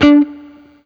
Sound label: BLU PICK, Guitar & Bass